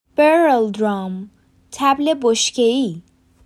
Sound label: barrel drum